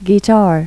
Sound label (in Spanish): GUITARRA; Pipo-Aprende musica con Pipo